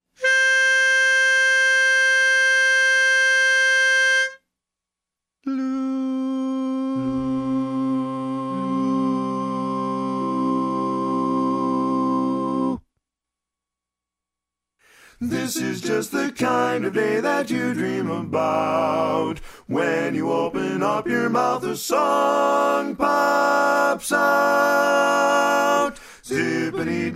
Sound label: Lead